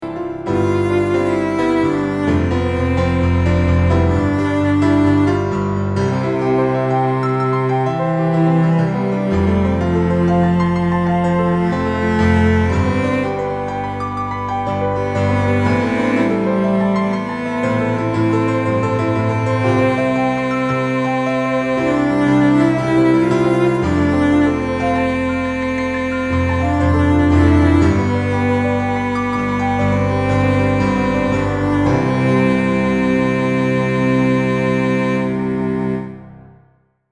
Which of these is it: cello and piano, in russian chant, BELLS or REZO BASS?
cello and piano